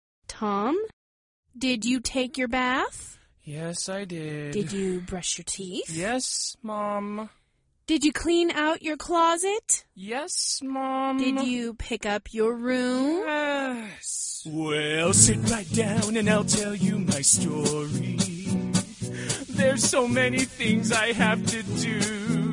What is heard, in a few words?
tom